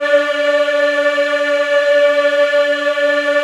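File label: D4 men women eh, Choir; USB Soundscan vol.28; AKAI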